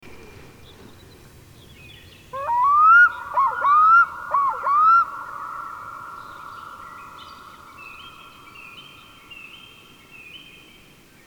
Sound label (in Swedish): Storlom